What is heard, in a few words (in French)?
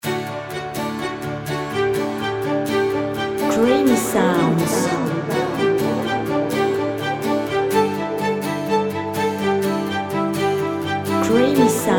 creation musicale violon institutionnel